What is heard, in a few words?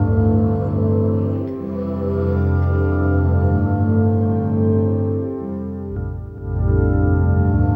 Acoustic Drone, Short Loops; Sonic Foundry (Sony Creative Software) - Friendly Fire; DroneLoop